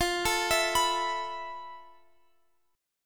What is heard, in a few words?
F Major 7th Flat 5th, F, down, Guitar, Open F; arpeggiated